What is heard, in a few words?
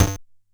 Bent Kick; glitch; Bent Hits